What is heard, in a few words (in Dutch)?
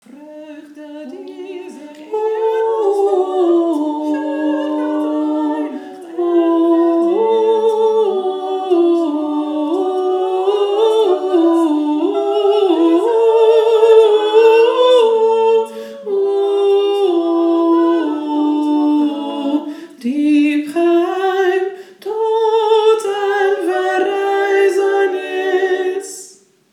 Licht geluid alt